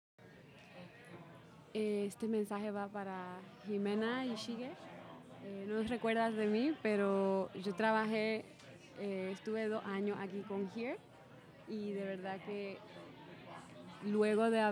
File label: Audience Recording; a-meal-premiere